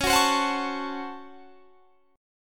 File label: Db Major 7th Suspended 4th Sharp 5th Db; strummed